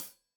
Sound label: Hat Tight, Hi-Hat, Cymbals; Deeply Sampled Drums